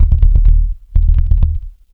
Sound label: MUTE BASS; BASSELEC KIT; Big Beat; USB Soundscan vol.26; Big Beat Samples & More; AKAI